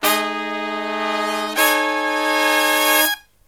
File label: Brass Riffs